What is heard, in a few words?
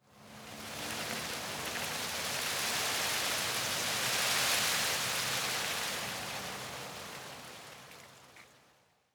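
tree leaves rustle soft, garten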